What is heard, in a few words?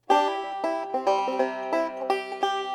A pick